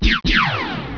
ZAP

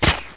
STAB, 碰撞声音素材

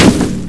CRASH, 碰撞声音素材

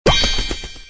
Correct; Seewo; EasiNote5

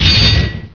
CLANG, 碰撞声音素材

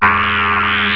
HUM

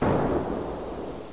cannon, 爆炸; 爆炸系列声音素材

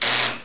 BUZZ, 警报

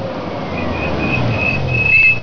TOYOTA, 汽车声音; 汽车声音素材

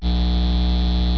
HUM, 机器

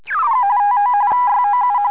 RADAR; 机器声音音乐素材

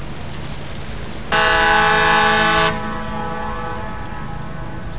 FERY HORN

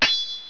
sword

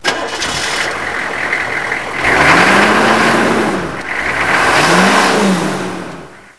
startup, 汽车声音; 汽车声音素材

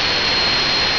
DRILL LOOP; 机器声音音乐素材; DRILLOOP

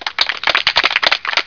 CLAP, 人群; 人群系列声音素材

